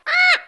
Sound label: Bird-chirp (Mexican Red Parot)